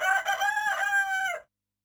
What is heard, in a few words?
ROOSTER; Yamaha DD-65